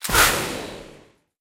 streak mission complete; War Thunder

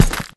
glass shards walk

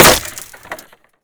impact wood; Modern Warfare

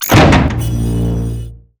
player join gate

